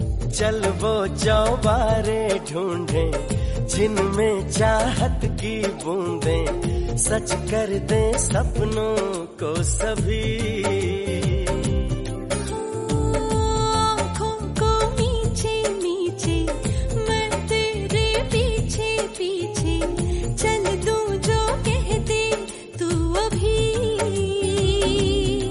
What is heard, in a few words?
hindi romantic; tashan